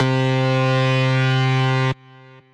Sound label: Bass Lead, Synth Lead; Hammerhead Bass Lead; Ableton; Factory Packs; Chop and Swing